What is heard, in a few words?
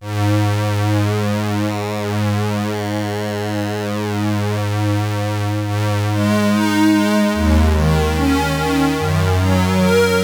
JUNO-60 PWM